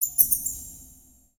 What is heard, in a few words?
rats reverb; ambience ninjago